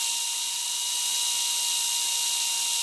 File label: Saw Little Loop